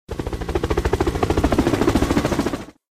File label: airplane; vehicle